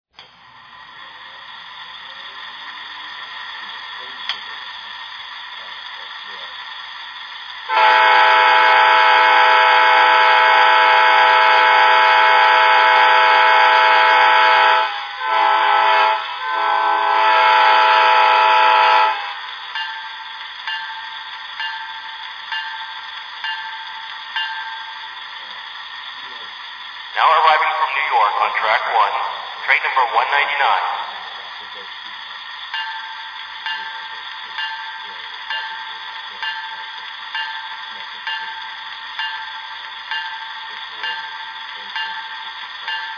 aem7, trains; amt

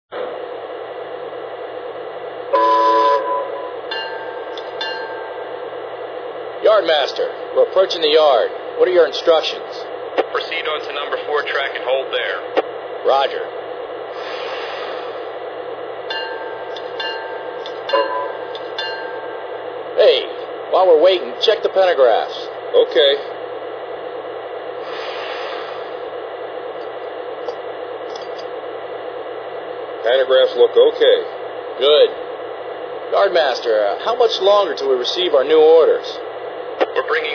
P5A, trains

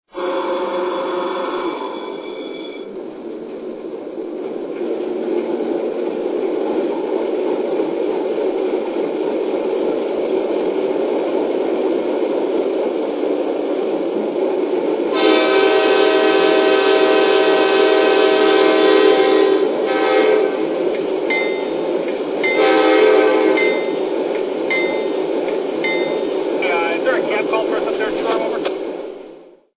AC6000

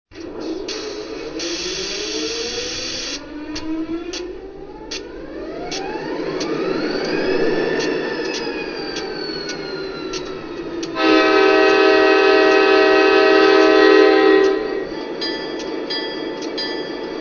SD40-2

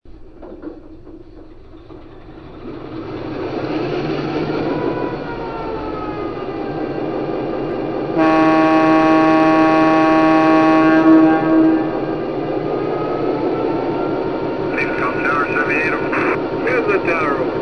F3 ABA

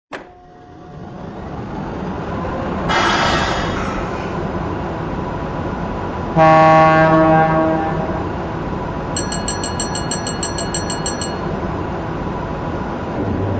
Electro

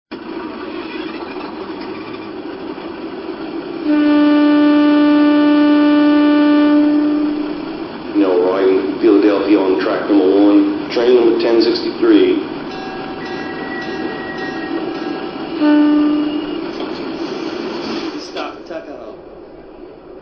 RDC BUDD

